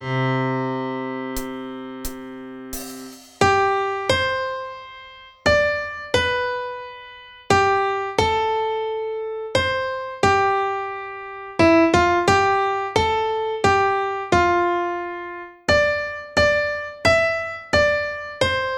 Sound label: Bahay Kubo (Piano, Strings & Overheads)